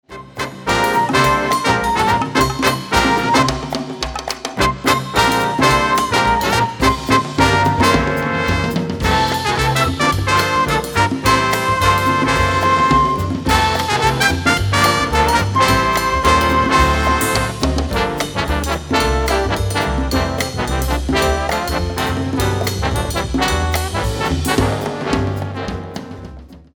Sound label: samba